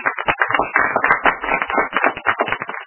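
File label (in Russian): аплодисменты небольшой группы людей редкие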